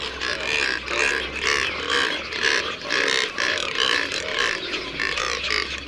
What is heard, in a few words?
Neotropic Cormorant